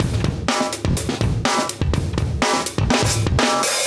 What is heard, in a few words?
124 live breaks